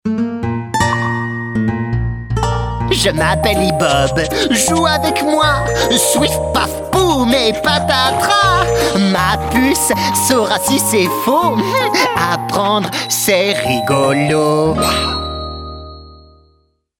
Chant

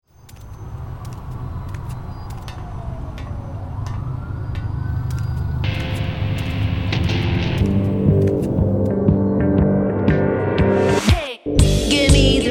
Gemey chanté

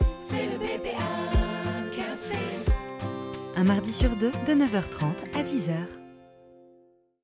jingle intro; Caisse d'epargne

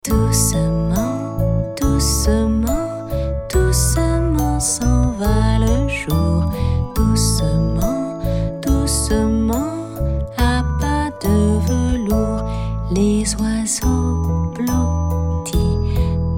Extrait chant

